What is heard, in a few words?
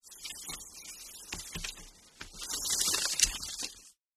paper rustling; paper mvmt